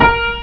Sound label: piano